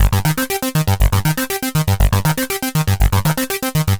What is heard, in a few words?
Arpeggio Bass, Prototechno